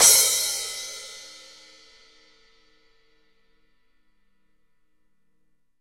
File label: Crash, Drums; PX7; Digital Sound Factory Protean Drums